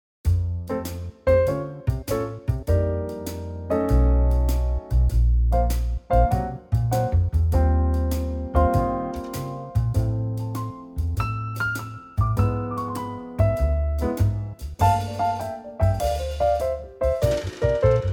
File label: F trio